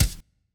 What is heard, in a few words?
Dance Drums; Best Service ProSamples vol.15; AKAI